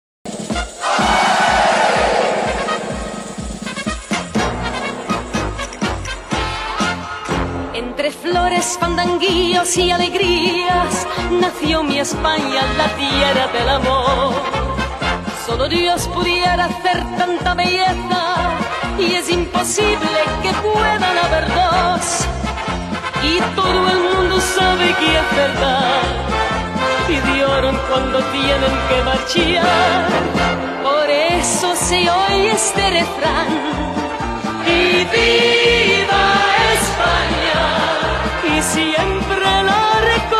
cantada MUJER

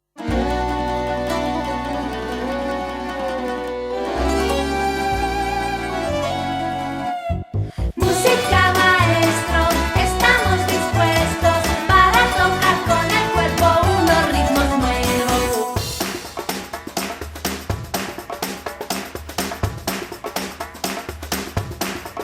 Música maestro

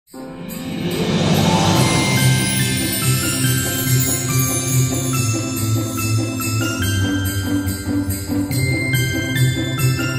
Música De Fondo Para Navidad

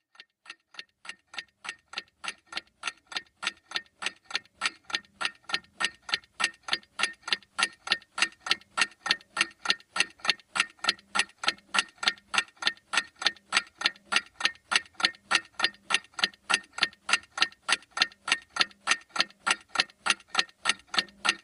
sonido de reloj tic tic

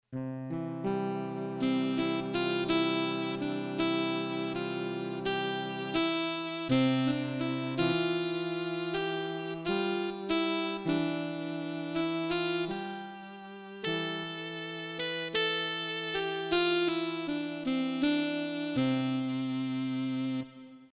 Saxo

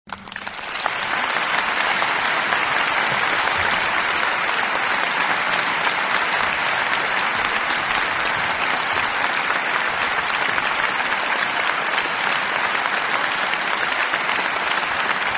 APLAUSOS sonidos de gente aplaudiendo SONIDOS DE PERSONAS APLAUDI

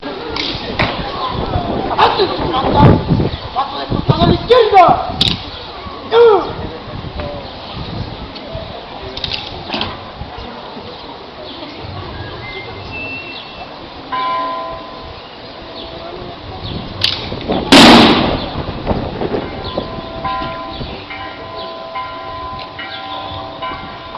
Banda de guerra de la escuela normal; San Marcos ZACATECAS

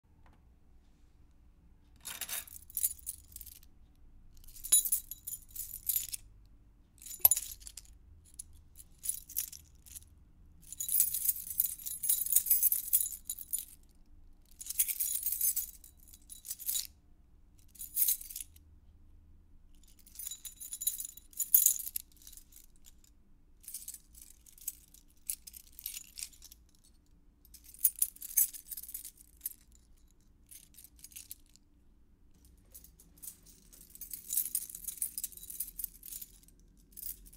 Llaves efecto de sonido

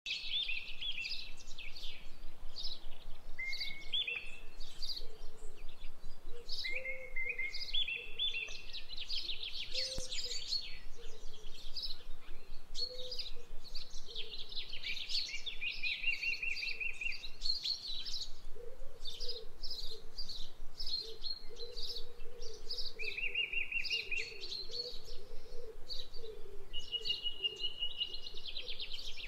Sonido de Aves

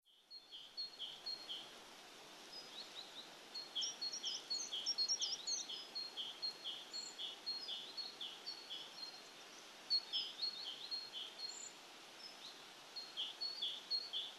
PAJARITOS

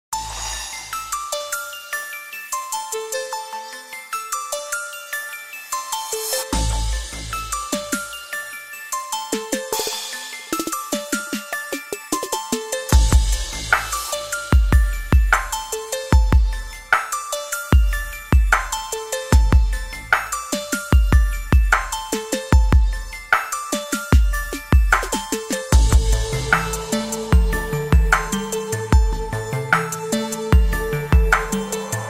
Musica de fondo para Vídeos de Tecnologia

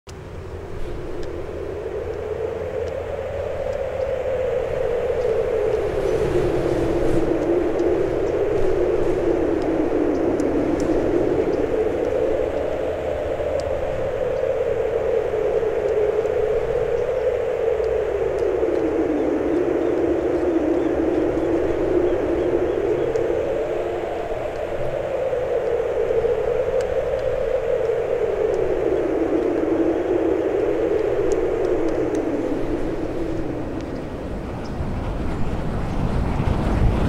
Sonido del viento Efecto de sonido del viento

